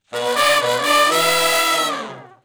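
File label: Shuffle Blues Riff (E) unison falloff w growl, Horncraft for R&B Sections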